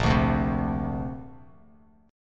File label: Bb Major Add 9th, Bass Standard; 6,5,0,5; strummed